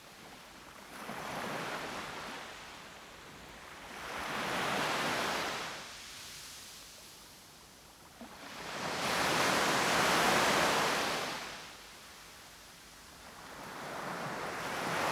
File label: Waves Beach Fizz Relaxing